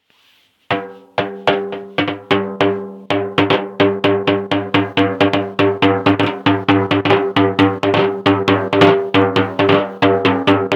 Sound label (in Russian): buben